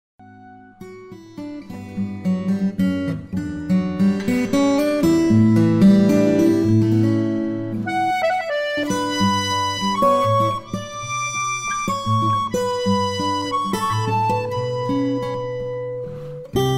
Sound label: Waltz; Connemara